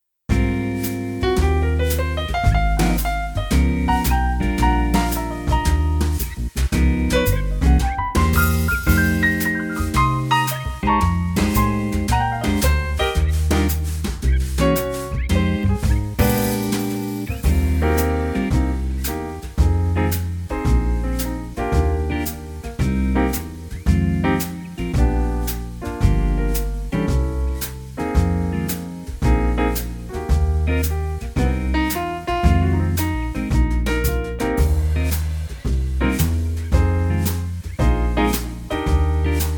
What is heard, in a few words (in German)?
CoolJazzClub Playback Eb-Dur